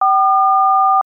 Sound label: dtmf dial tones